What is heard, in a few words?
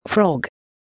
frog